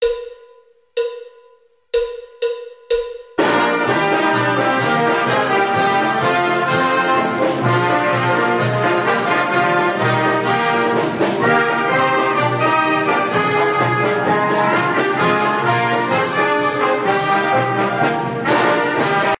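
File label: March with Pickup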